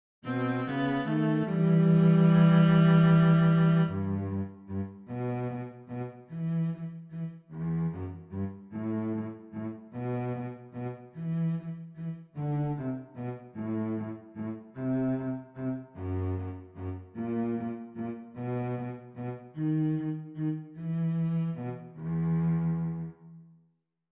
VIOLONCELLE; Il est bon de louer

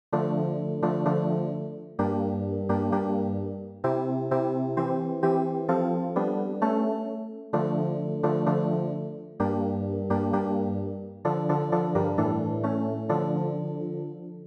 CLAVIER

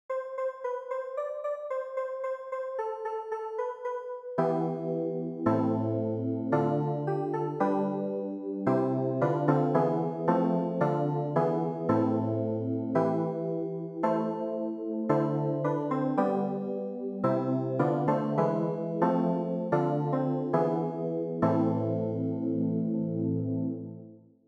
doxologie solennelle clavier

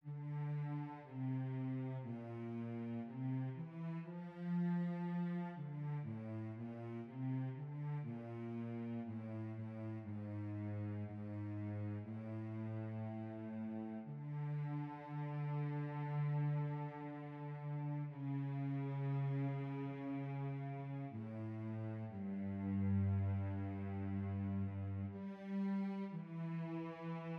VIOLONCELLE; PSAUME 31

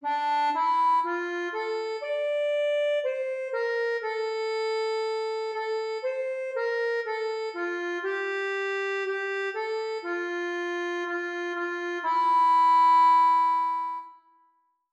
ACCORDEON; PSAUME 31

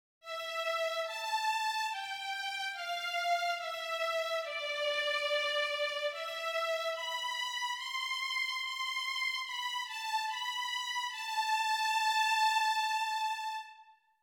VIOLON; violons

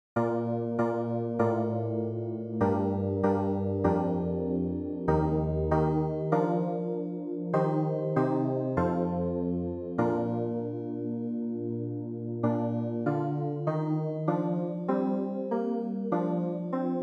CLAVIER; PriereDeFrancois

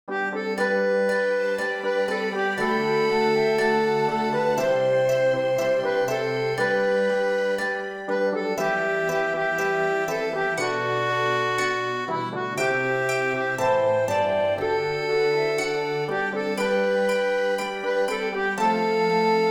orchestre

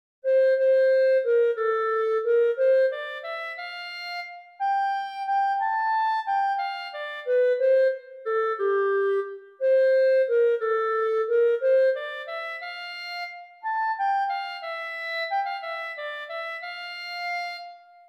CLARINETTE

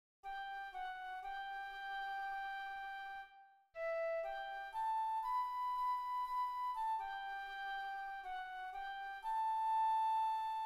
FLUTE

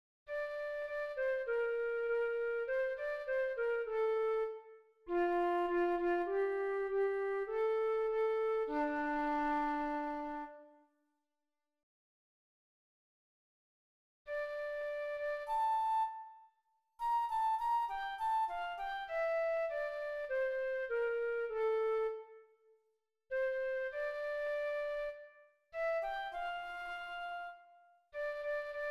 FLUTE

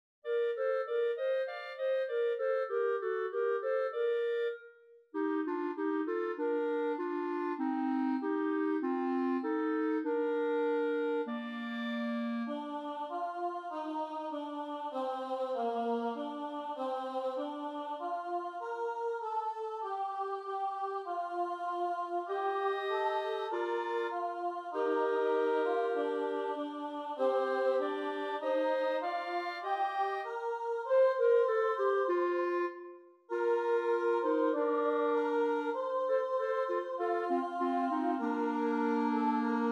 clarinette; PriereDeFrancois

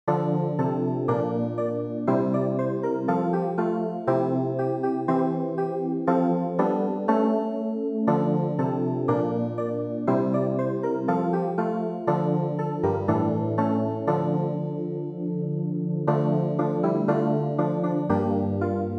SANCTUS CLAVIER; St Jean

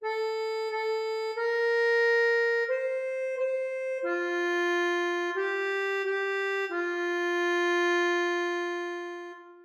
ACCORDEON

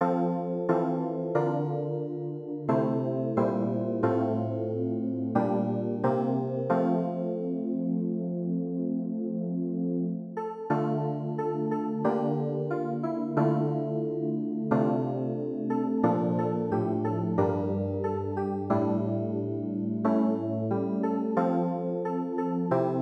clavier

